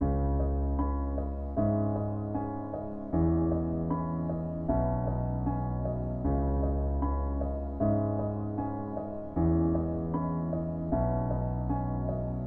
cinematic brooding piano C# 154bpm

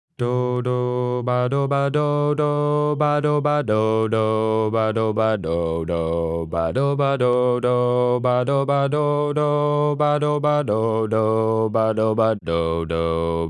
Bass

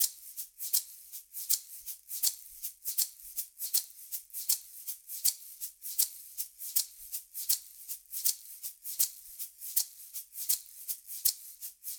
Shaker; Loop Set - Smoke Signal - Live Trip Hop Drums

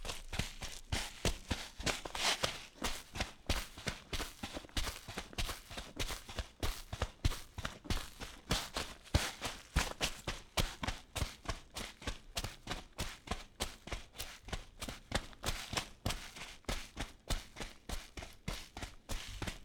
Sand Boots Running; footsteps

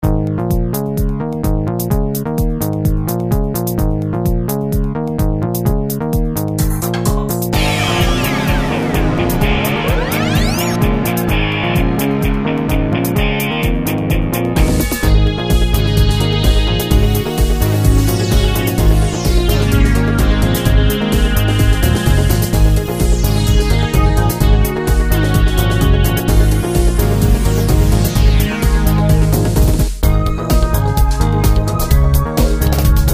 Astral Projection, Music background pads

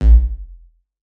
808 BD long, Roland-808 Drum Hits Bass Drum

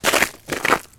Footfalls In Squishy; footsteps

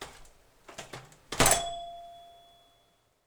Door Slams; doorSounds

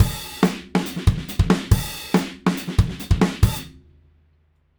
Drums Rock Ending, loops; Alex Sanfilippo

